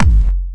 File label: kick bass